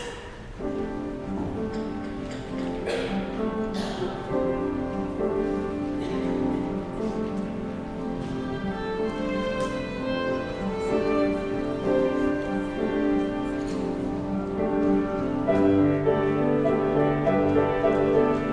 Choral

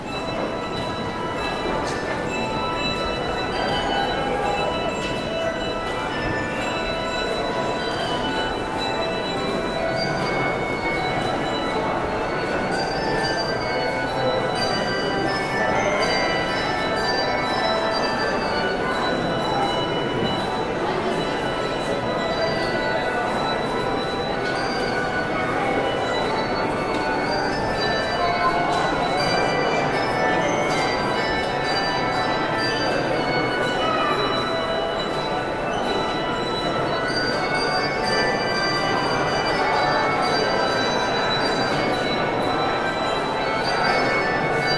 Handbells; Fantasy on Ebenezer; Behnke

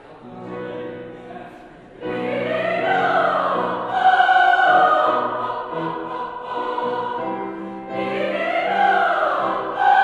Choral Warmup

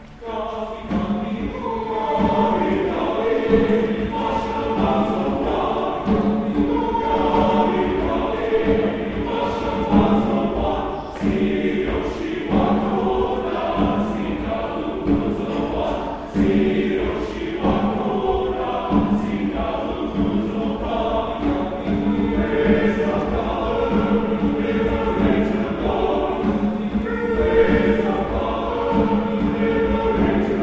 Choral

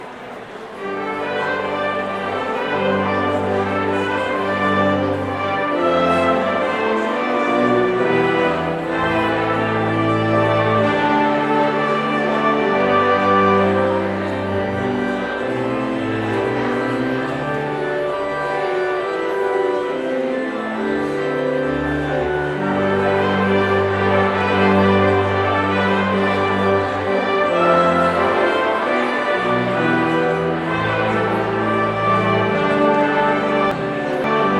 Postlude

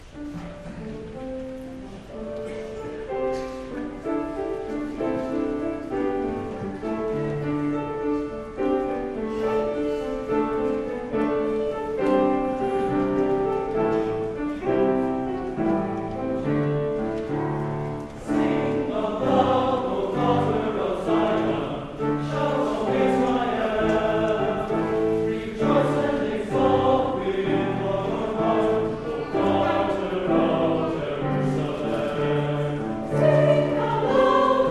Choral

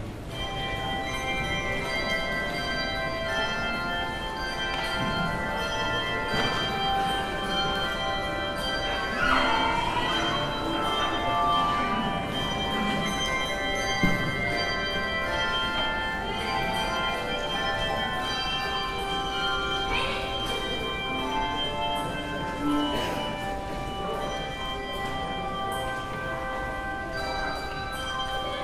Handbells; Let Us Ever Walk with Jesus; Behnke

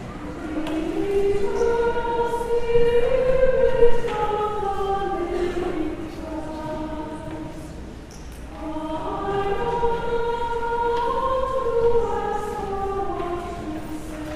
Choral

